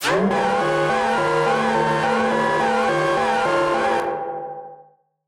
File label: Battered Synth; malfunctioning synth samples